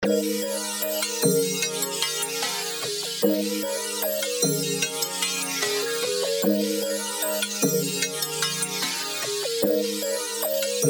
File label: Alt Trap 150BPM